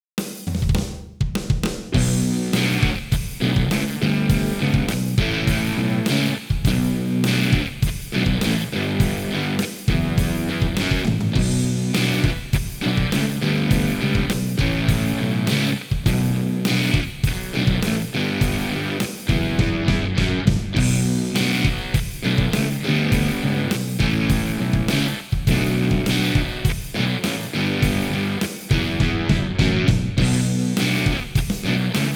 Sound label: Rock; Test A Console